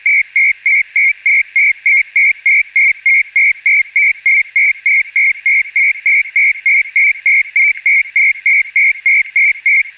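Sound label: 250 Bd ARQ, SIEMENS CHP-200 ARQ-system; SIEMENS CHP-200 ARQ-system in non-FH mode; SIEMENS CHP-200 FSK-SYSTEM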